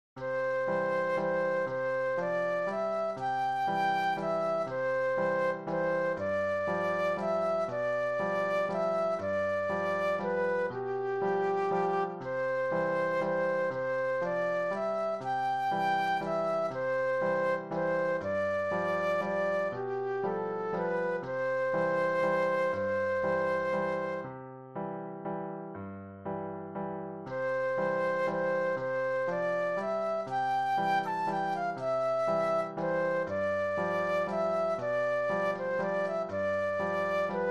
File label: Flute Solo